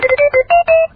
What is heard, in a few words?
happy birthday to u